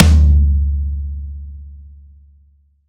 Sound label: F. Tom, Roland BK-5; Toms